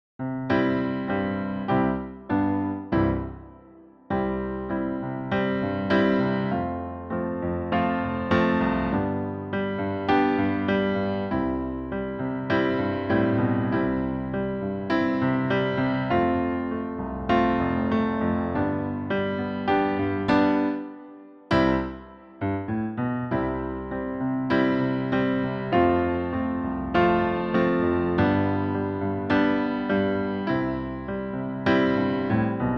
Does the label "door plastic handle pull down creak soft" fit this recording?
No